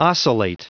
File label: oscill